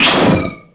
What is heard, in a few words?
blink teleport